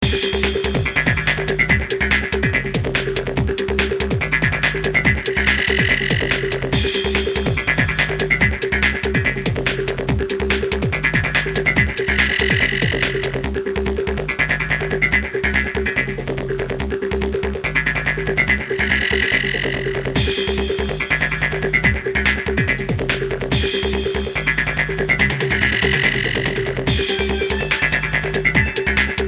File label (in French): Ambiance; Lyoko Chemin Perdu